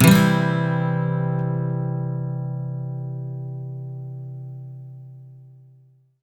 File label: MAJ SWEEP C, Electric & Acoustic Guitar; USB Soundscan vol.04; AKAI